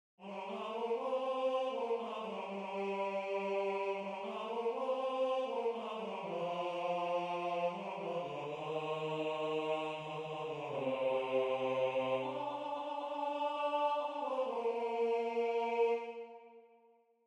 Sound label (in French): La part Dieu Tenor; La Part à Dieu - Lallement